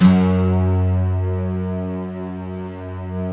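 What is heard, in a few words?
bright guitar strings; Optical Media International - Sonic Images Library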